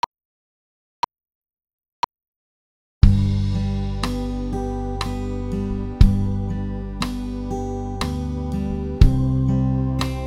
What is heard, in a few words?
Fingerpicking Pattern